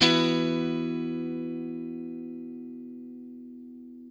CHORD LN 6, guitar; Quantum Leap - Guitar & Bass

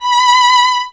STR VLN, Vln Detache; Miroslav Vitous

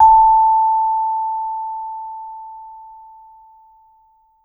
VMED A4, Vibes; Sampleheads - Dave Samuels Marimba & Vibes

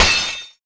SPD-8, DIGITAL; 90 s Sample CDs; Sound & Vision - Gigapack I CD 1 (Roland)

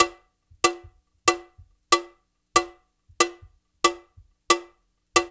COWBEL; East West; Funky Ass Loops

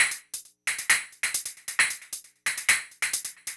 Hi-Hat Esoterica, Beats

